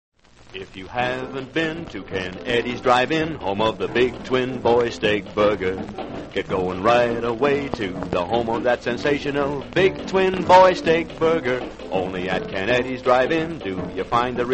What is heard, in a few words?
radio jingles; ken eddys drive in; allied radio artists